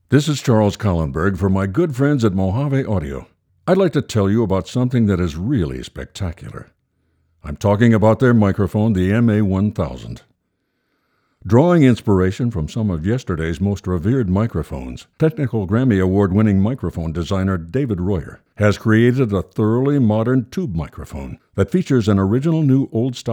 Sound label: Chest Voice; MojaveMA-1000